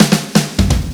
Electro Fills; Electro Fills Packs

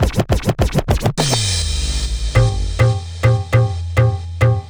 Dholki Beat

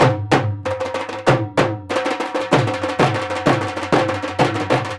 DHOL intro

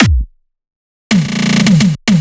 Dholki Beat

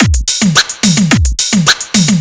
Dholki Beat

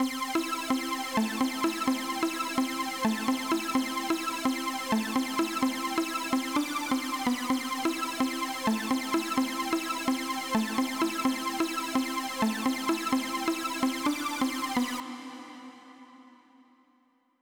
Piano Melody Beat Loops

